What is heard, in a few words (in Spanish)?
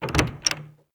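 ABRIR PUERTA, open door; yesterday origins gamerip 2016 switch ps4 pc ios macos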